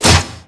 Damage Stick

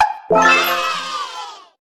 levelup; Clawbert ToyTown; android ios mobile gamerip 2018

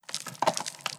Damned Tourment Skull; Legend of Keepers

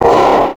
Bang Soft Short [bass]; deimos rising

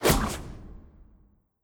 Poison Dart Throw

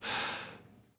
Sfx Darts

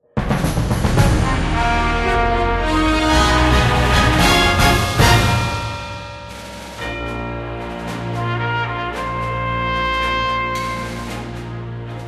Victory Jingle 112 4-4; Suitsmiths

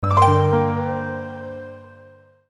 Puzzle Start; Juufuutei Raden's Guide for Pixel Museum

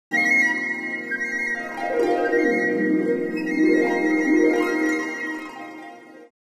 Level Complete; Buildalot

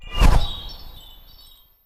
Artic Spell

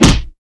Small Punch; Knight Online

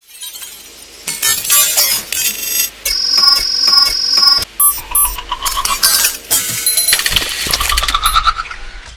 Crab Awakening

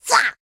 Gold Miner; rabbids multiverse; iOS, macOS, Mobile

